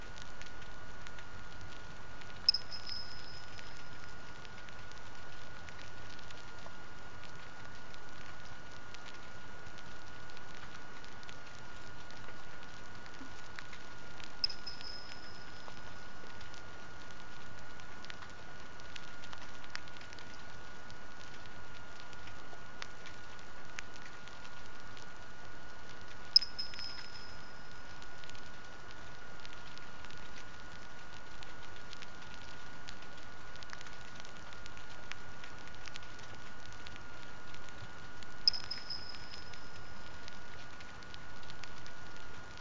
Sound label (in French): sonar ralenti